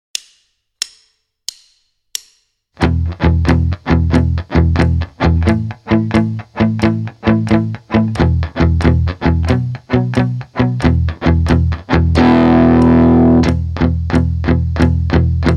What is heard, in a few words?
muted shuffle eights, rhythm guitar; Basic Rhythm Guitar II - "rock it!"